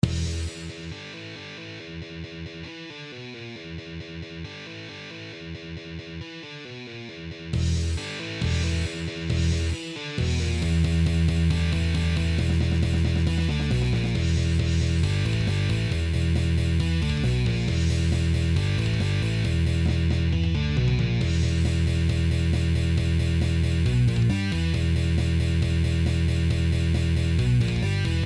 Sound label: Riff, guitar